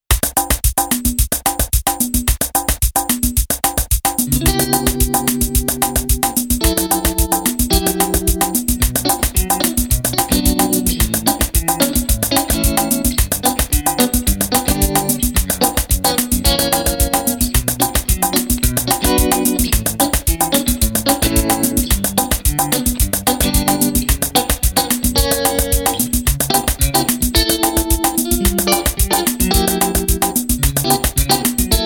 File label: mini5 latin ac15 trem; vox